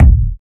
boomy bass drum single hit A key, bass drum one shot tuned to the A note